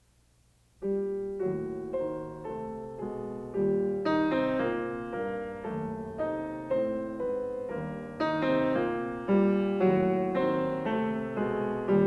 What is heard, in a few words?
Carnaval for piano; Schumann; Op9